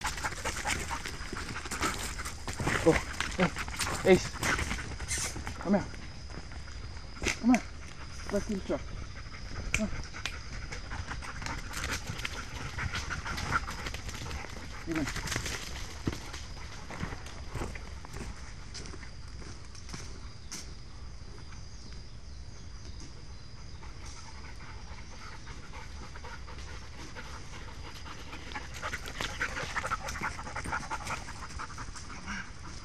dogs, olofonia

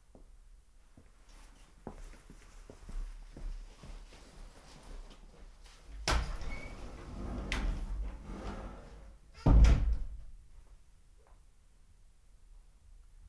capelli, olofonic; olofonica; illusioni sonore

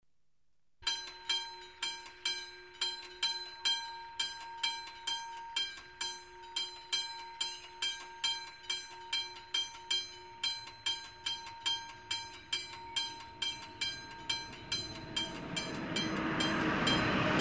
Passaggio a Livello; olofonic; olofonica; illusioni sonore

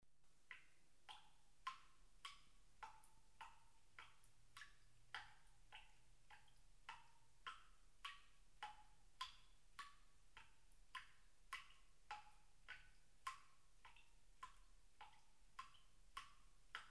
gorgoglio, olofonic; olofonica; illusioni sonore

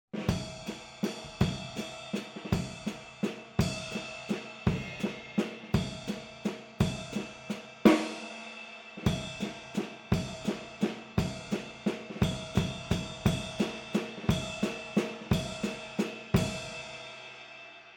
valzer, olofonia

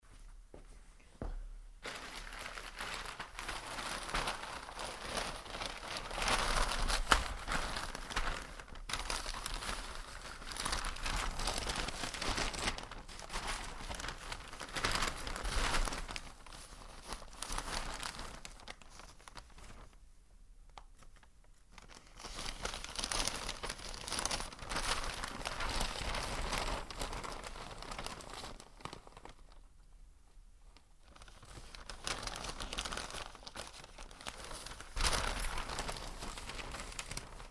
carta, olofonic; olofonica; illusioni sonore

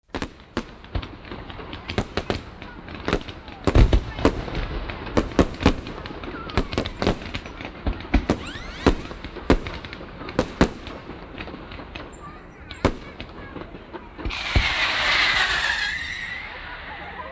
fireworks, olofonia

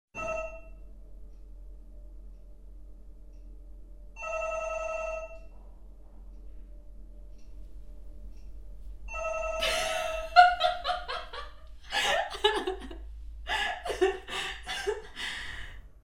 Telefono, olofonia

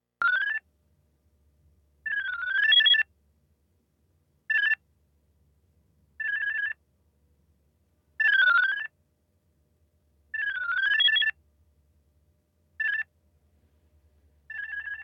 suoneria, olofonia; L'olofonia è una tecnica di registrazione e riproduzione audio eseguita tramite uno specia

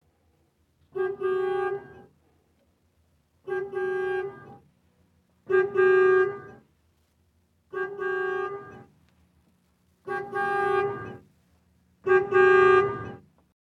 Clacson, Olofonia

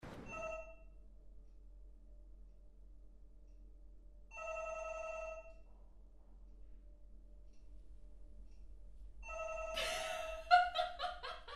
squillo, olofonic; olofonica; illusioni sonore

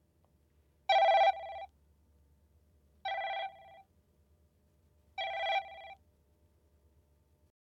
suoneria, olofonia

